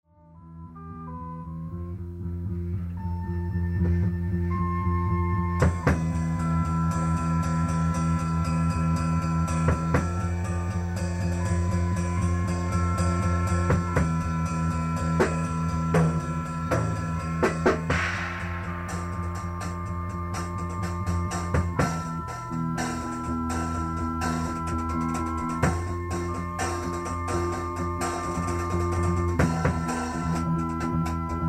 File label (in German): Keyboard traurig